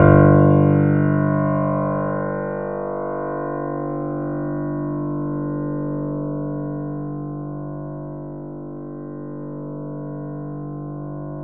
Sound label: G 0, Keyboards Acoustic, GRANDP ST; USB Soundscan vol.17